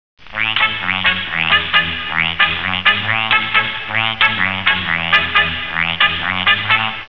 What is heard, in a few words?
trombone sound